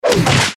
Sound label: eastern swishes